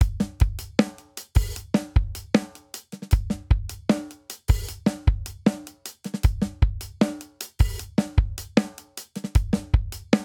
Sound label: Grunge Rock Verse 77 bpm, Drum; 4 4; MIDI Clips; Ableton; Factory Packs; Drum Booth